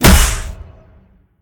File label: pwr hit stun god; smite; gilgamesh